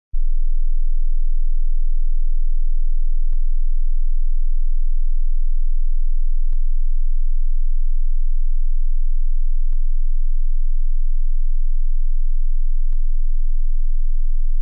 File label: 20 hz sine wave